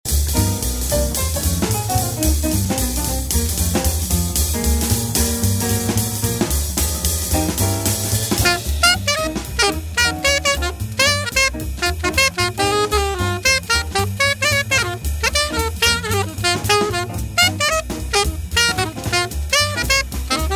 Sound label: vintage king 613 alto saxophone, hard rubber mpc; sml paris